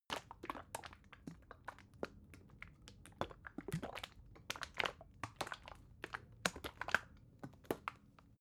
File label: bottle syrup movement unsteady, gastronomie; berufe